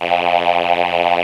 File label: C 605 CHOR, Yamaha C605; TECHNO-BWARE